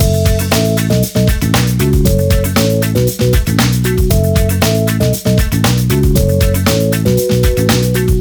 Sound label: fresh and funky loop